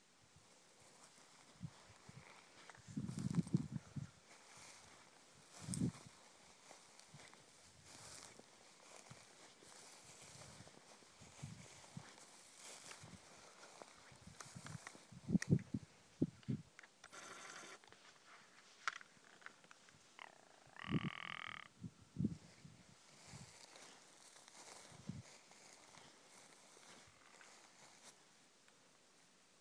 ptarmigan

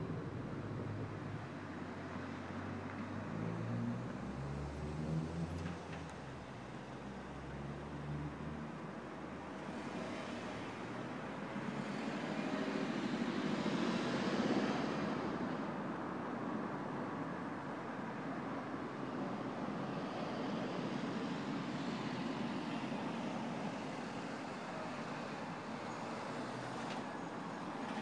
night traffic; roomhill

